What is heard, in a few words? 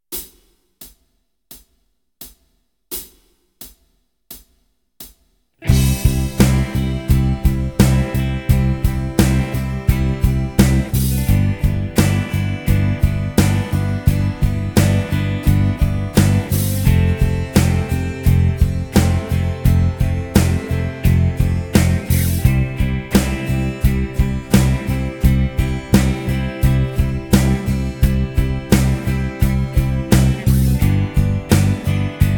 Backing track; Kenneth Baker - The complete keyboard player